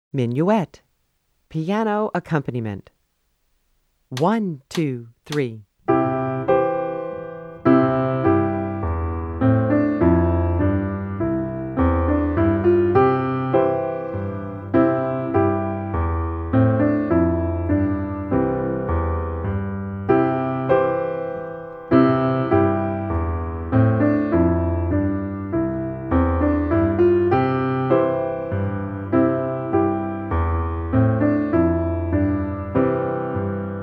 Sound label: Minuet Piano Accompaniment